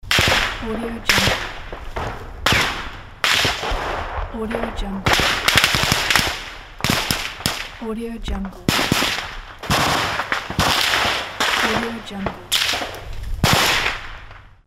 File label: war battle shots